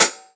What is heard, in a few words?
Hi Hat Closed; pitchglitch samples; Lightning1024